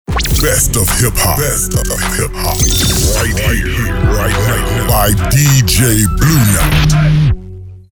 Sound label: Hip Hop with Effect